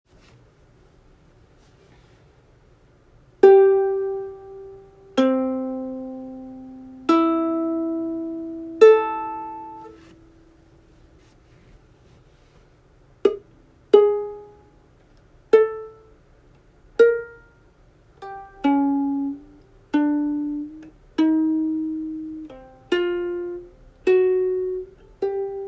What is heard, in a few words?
Plucking Practice